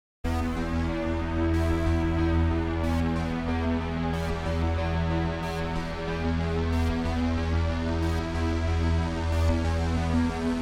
Alchemy jupiter strings transform

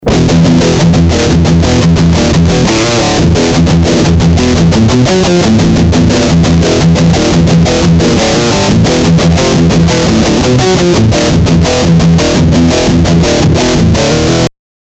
mega metal

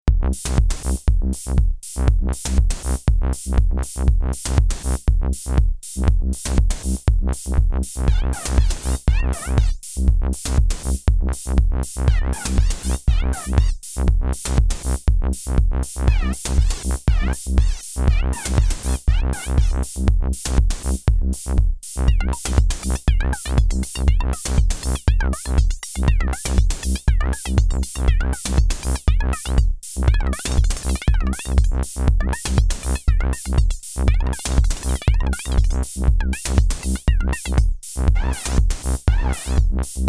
Soma Pulsar 23 Patch MIDI Flanger

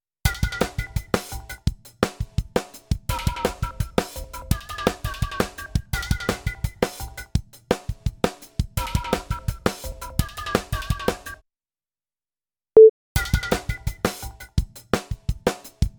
Drums Percussion; GR 45dB; Release 2 uhr

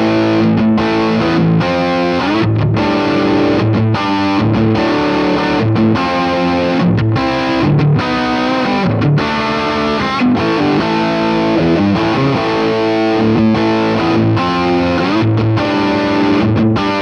ACS1 v2 London Full Gain Room; Walrus Audio

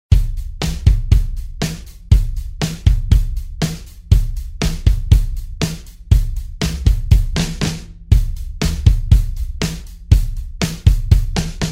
Bus Exciter Hardcore Kick

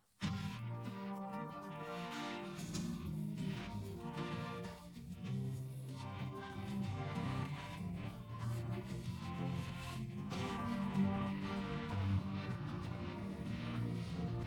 other G minor 95bpm 440hz

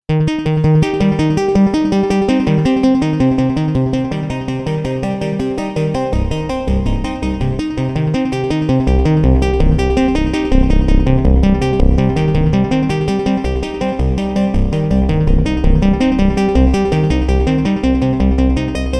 Waldorf Quantum

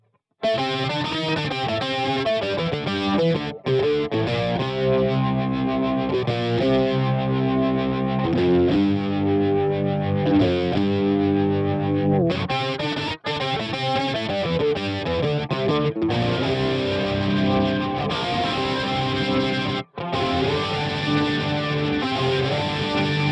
Driven Organ; Headrush Software Update

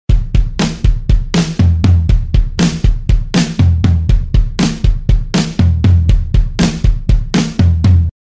Bus Peak Hard Kicks Contouring

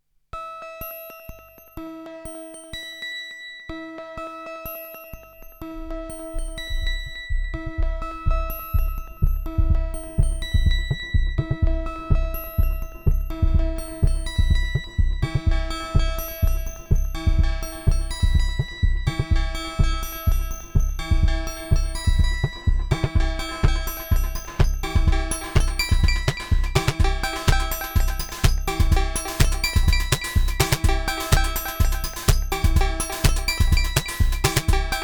Routing Note Transpose; Twisted Electrons Blast Beats

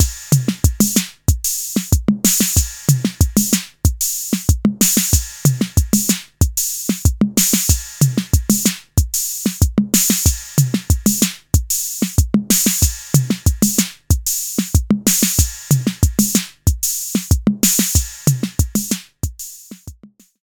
Beat TR 606 Hardware; D16 Group Audio Software Nithonat 2

